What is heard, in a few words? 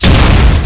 flak cannon fire